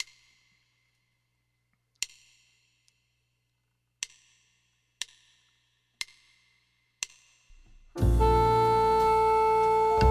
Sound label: Bb Soprano; First Steps